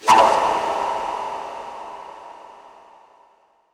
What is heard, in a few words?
Short Fills & Reverse; Vengeance Samples